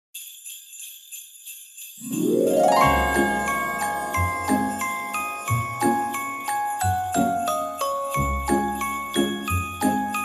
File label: Sweet and Emotional Christmas With Sleigh Bells